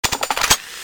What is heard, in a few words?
draw, weapons; csco; csgo